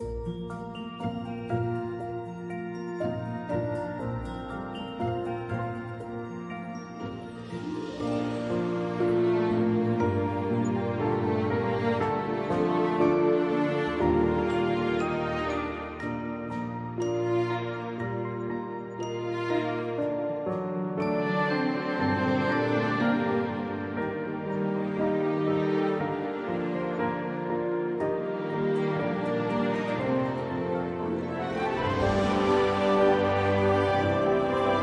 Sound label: Ambient